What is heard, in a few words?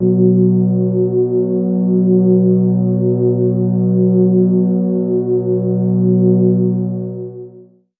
pad f sharp3